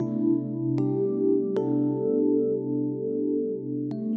Fusion Electric Piano

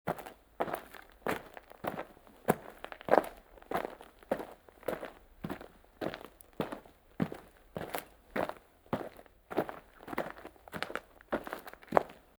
Footfalls Gravel; footsteps

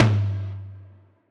Tom; Loop Set - Futurism - Synthwave Loops; Drum Samples

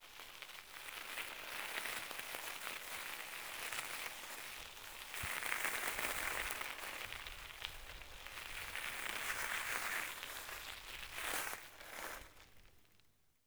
coasting In Gravel, vehicles

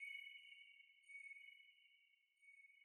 Synth Melody Tail; 90 B; New Age Ambient Loops; Tail Samples; Spring

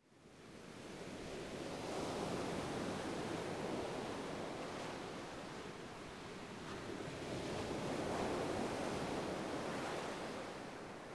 Ocean, ambience

